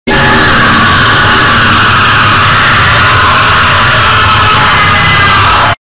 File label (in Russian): kriki skrimery